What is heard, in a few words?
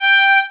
violin